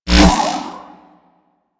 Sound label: Kick Punch Whoosh; Warframe; Weapons; Corpus